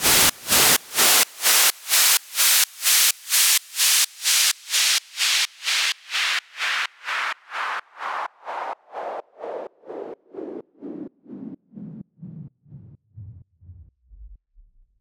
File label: Fall Side Chained